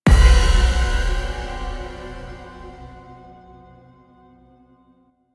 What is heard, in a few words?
Stinger Fail; starcraft 2 wings of liberty